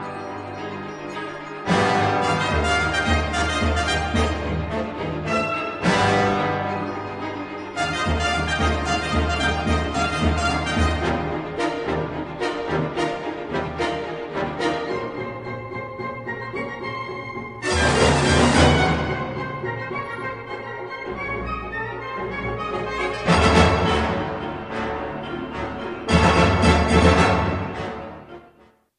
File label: concerto for orchestra